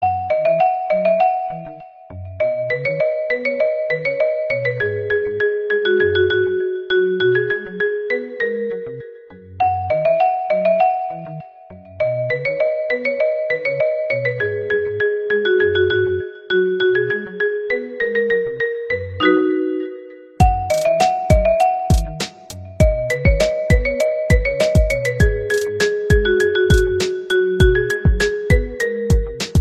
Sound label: Cat Xylophone Ringtone